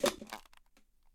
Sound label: Ramen Stop Eat; Game rip; MiSide